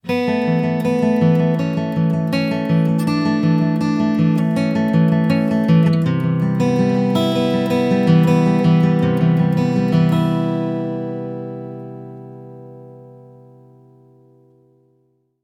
Acoustic, ringtones

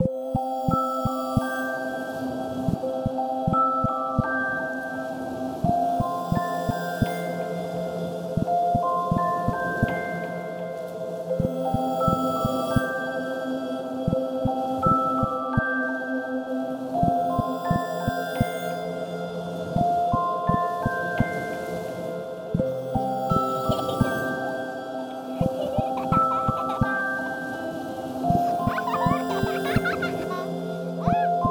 Lullaby; Motorola; Edge 70 Max China; alarms

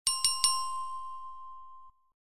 Triplet, notifications; Infinix; XOS 10

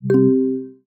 Charging Ended, ui; Amazon Fire-Max-11

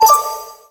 sent successfully; ZTE Nubia Z5S Mini; ui

